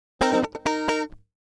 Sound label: 133 Dance El-Guitar F; Dance DJ